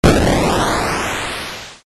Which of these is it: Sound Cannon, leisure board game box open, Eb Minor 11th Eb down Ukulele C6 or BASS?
Sound Cannon